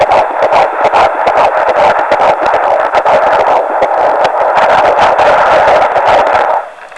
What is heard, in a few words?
doppler